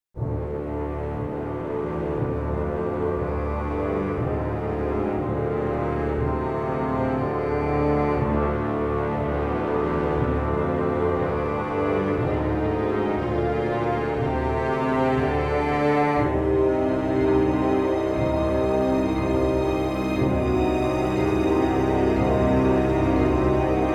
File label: Suspense